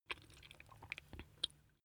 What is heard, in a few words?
swallow food